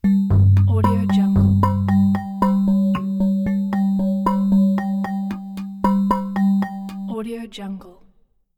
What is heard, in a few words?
modern logo